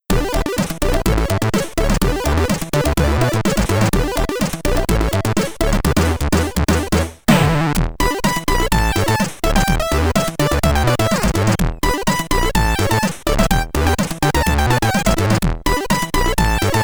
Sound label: BGM, commodore 64